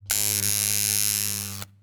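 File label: light on electricity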